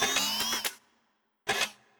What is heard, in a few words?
Mantis Blade; Moove